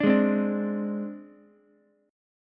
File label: C Suspended 2nd Sharp 5th, C, Ukulele; strummed; JGuitar